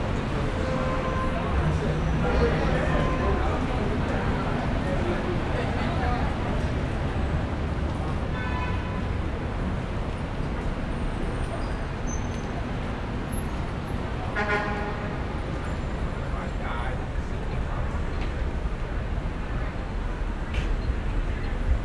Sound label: Urban day traffic people horns frequent; urban and suburban; boom library